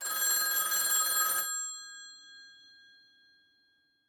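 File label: 1970 Telephone Ring 1 4 Seconds Cycle Netherlands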